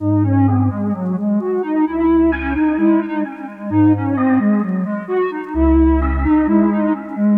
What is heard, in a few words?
Melody Loop - 130 BPM C# Min; Cymatics; Oracle Sample Pack